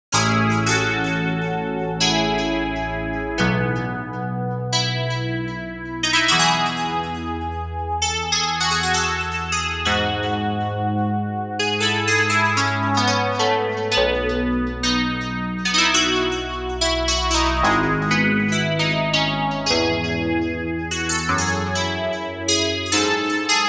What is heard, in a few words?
ethno asian instrument